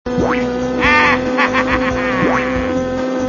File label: laugh; wario